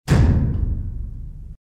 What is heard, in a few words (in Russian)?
udar v obshivku; Звуки подводной лодки